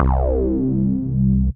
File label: Whammy Reso, Operator Bass